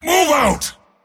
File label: move pain; dota; mars